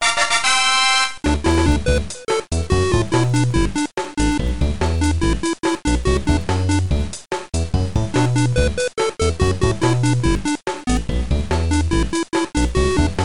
VS Win

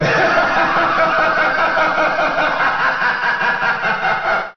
Laugh; Master Hand; Melee

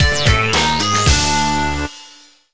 Fire Ride Fortress Lose

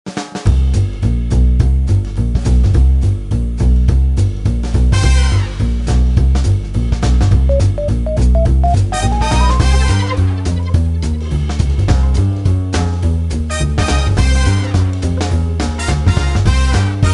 Going for the Coins

